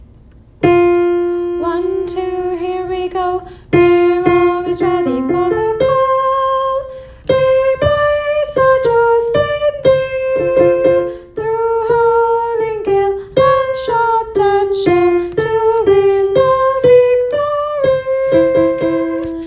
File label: Coast Guard soprano, Military Songs, Chorus